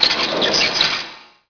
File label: jail door; AMBIENT; Pirates Of The Caribbean